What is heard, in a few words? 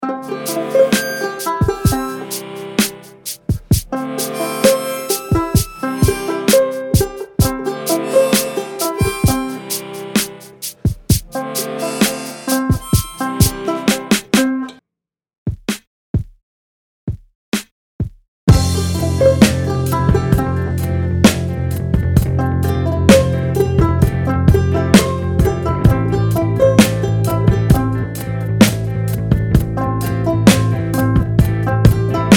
Harmonica Hiphop